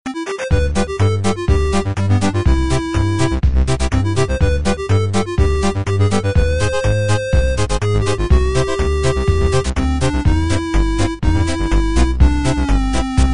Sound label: 8 bit